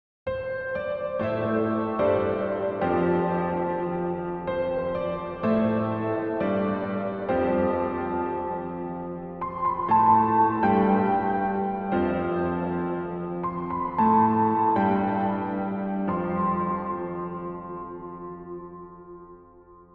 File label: Ocean Deep Piano; Instruments; Pianos; MainStage